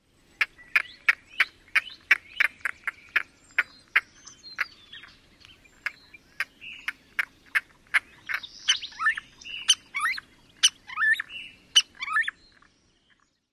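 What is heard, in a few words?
Groove-billed Ani throaty cacks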